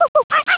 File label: ui camera shutter, Motorola ROKR EM35; ui sounds